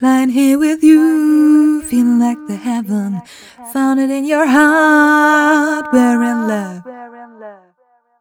f mel c, fem Vocals

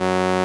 SOLINA, String Machines

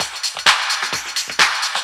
groove, DRUMLOOPS

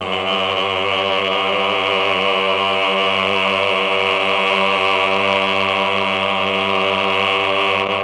CHOR; ILIO; Propeller Island Legendary M400

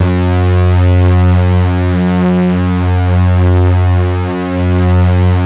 SYNC DEEP, PADS; Masterbits; Vintage Voltage

